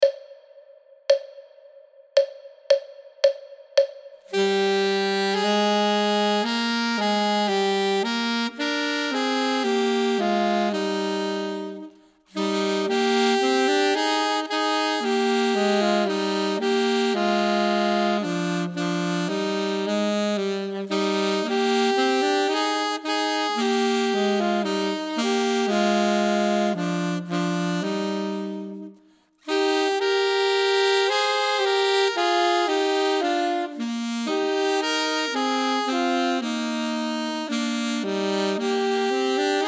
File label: Duet; Ten Easy Duets